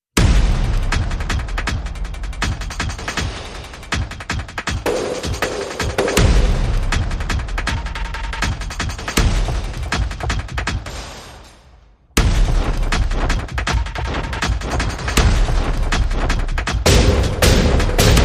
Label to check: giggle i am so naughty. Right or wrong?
Wrong